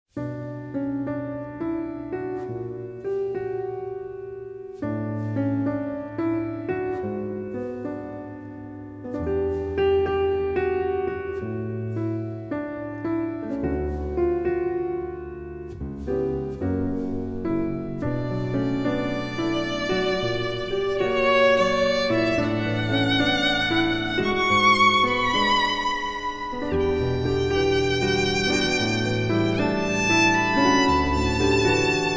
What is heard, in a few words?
Piano Raindrops; Newage